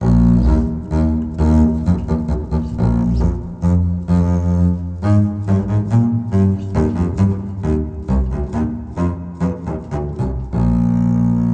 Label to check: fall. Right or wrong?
Wrong